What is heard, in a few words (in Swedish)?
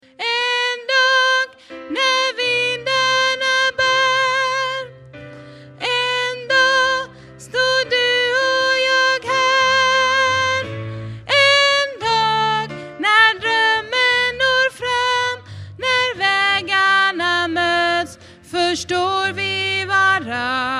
bas